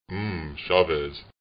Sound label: mmm; chavez